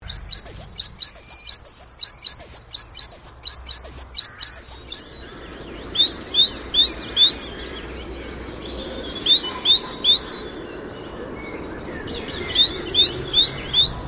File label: great tit variations, birdsong